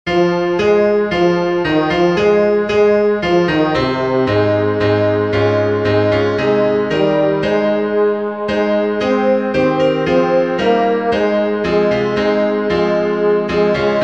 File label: restoration tenor; “Come You Sinners Poor and Needy”